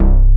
SYNTH BASS; E-MU Formula 4000 Series Vol. 2

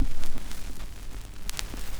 SCRCH, Record Noise; AKAI S-Series CD-ROM Sound Library VOL-4

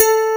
DG WAVE, DIGI SYN; AKAI S6000 CD-ROM - Volume 1; SAMPLE WAVE MIXING 1

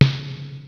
RY-30 PERCUS; Rubber Chicken Software Sample Bank

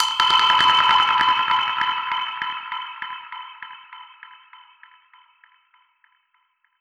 Perc Hit, dub percussion one shots; musicradar

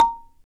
BALA, Balaphone

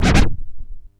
ST SCRTCH, SCRATCH; AKAI S-Series CD-ROM Sound Library VOL-4

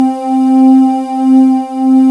VOI SYN, Voices; Sound & Vision - Gigapack I CD 2

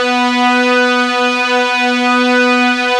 OMNI REEDS, ARP Synths, Analog Odyssey; E-MU Formula 4000 Series Vol. 3; ARP Synths II

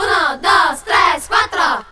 VOX SUNG; Megabass Remix! 2

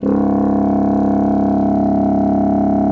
C#1, bassoon; Xsample VOL-6

